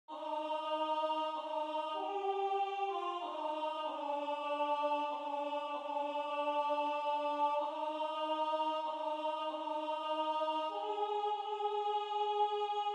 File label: Sah ein Knab ein Roslein stehn Alto